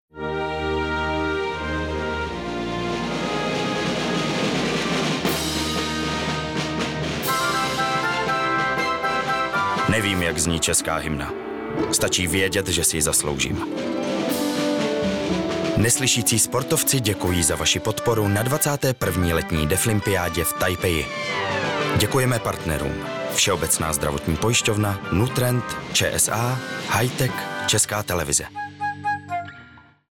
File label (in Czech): Hymna CZ; Deaflympic